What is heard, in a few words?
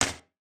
hit; breaking falling hit sfx